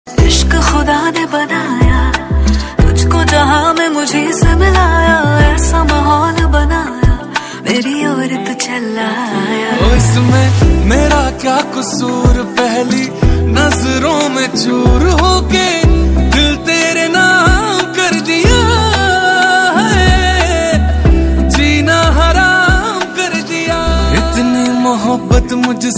Female Version

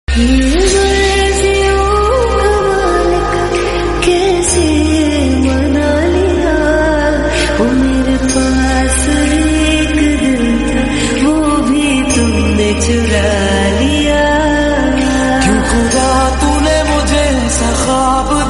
female version

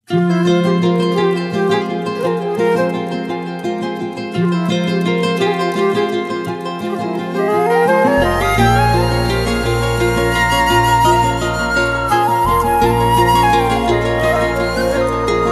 Flute

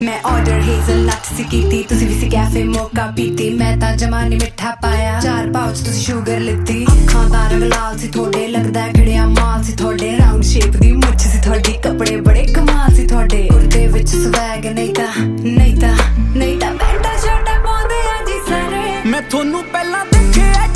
RAP SONG

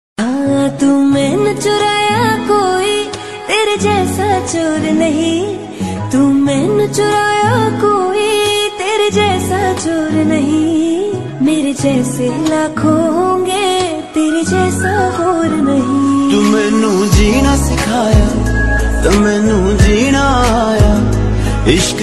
New Punjabi Song

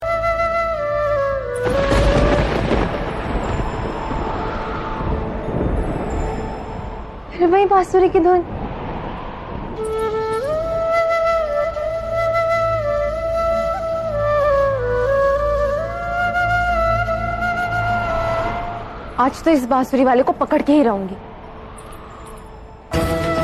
serial sad theme

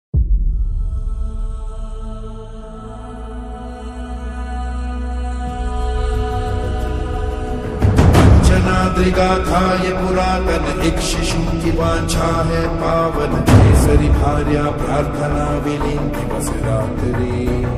Theme BGM; Hanuman Movie

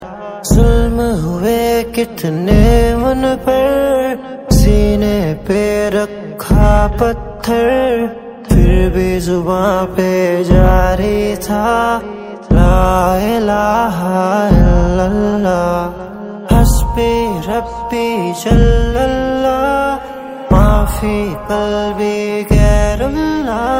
song

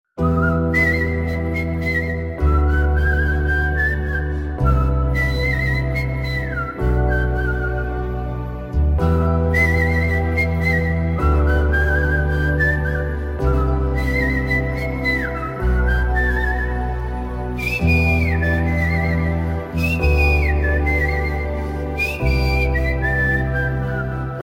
Flute Version

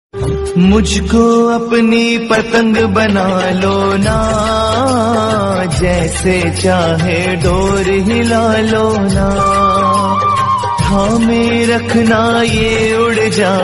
Bhajan

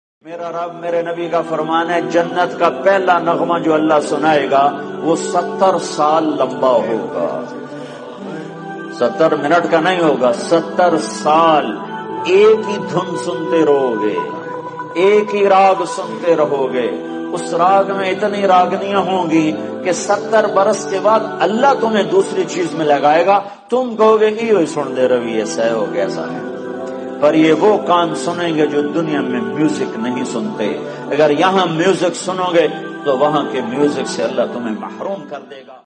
background music

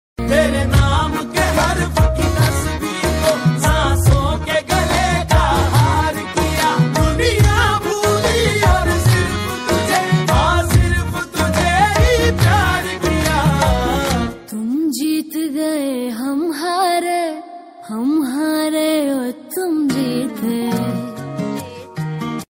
Female Version

